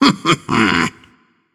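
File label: "heh heh", nonverbal; smite; voicepack; khepri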